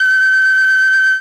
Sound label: flt a#2, Flutes, ORCHESTRON